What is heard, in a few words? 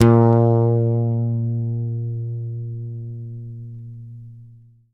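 YAMAHA5 SUS F#2, Bass; Sampleheads; Will Lee Bass Library; YAMAHA5 MUTE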